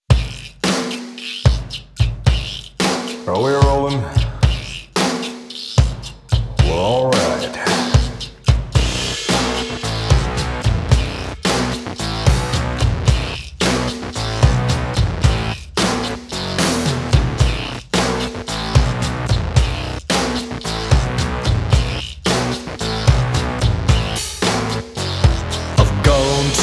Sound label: rock big open, drums